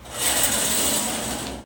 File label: door close; Golden Crayon Sounds